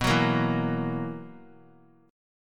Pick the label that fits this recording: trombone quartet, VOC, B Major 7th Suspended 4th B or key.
B Major 7th Suspended 4th B